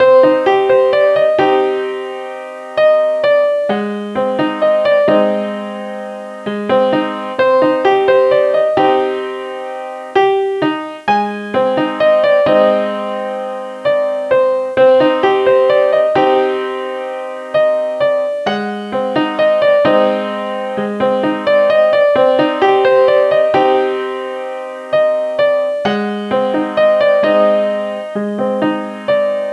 piano

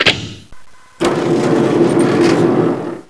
clock move

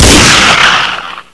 MP40 shoot, weapons

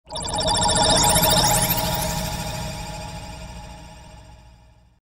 Alien Com

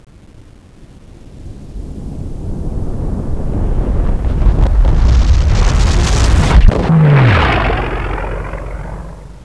teleport away

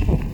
thud; ragemap2024; grunt

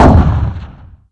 saw fire, weapons

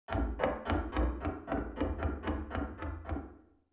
wood run by

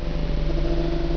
mecha

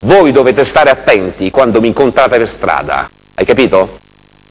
zombie idle

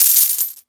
cash pickup

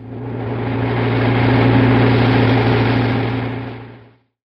car sound

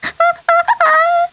alert; teddy

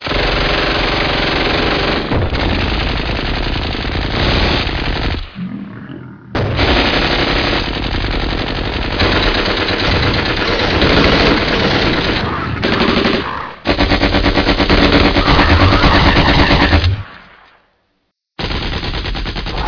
intense fighting

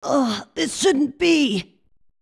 death; gohan

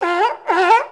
seal

